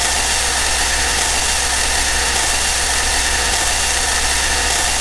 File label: electric shock